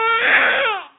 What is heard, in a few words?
crying, baby cry